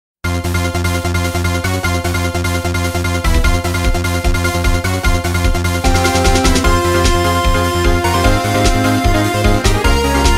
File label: objection music